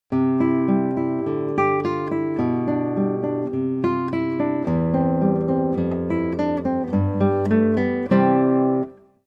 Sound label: allegretto, guitar